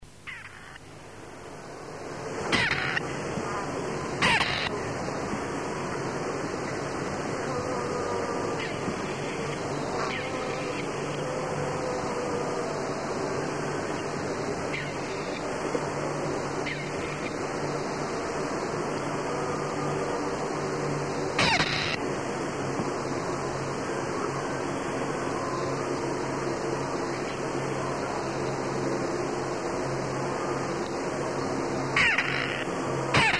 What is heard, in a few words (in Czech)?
koroptev